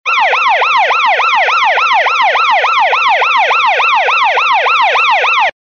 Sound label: siren yelping emergency